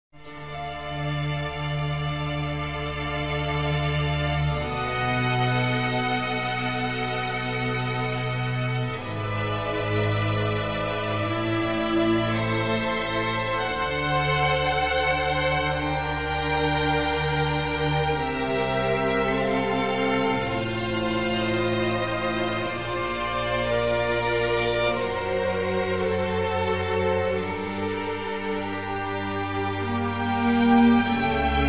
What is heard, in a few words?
Concerto for Strings in D minor; RV129 Madrigalesco; Antonio Vivaldi